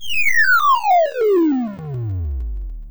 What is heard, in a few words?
falling; movement